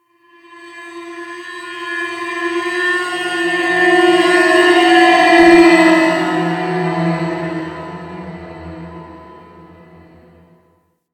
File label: Derelict Creepy One Off, Ambience, Interior; Warframe; Orokin Derelict